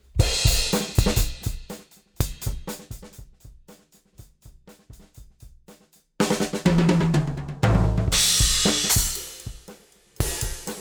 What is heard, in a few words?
GATE LPF 4.00kHz High Q; AMEK 9099